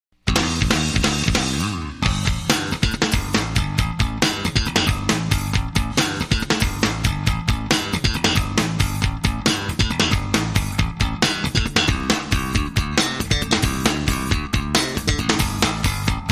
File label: Drums & Bass Full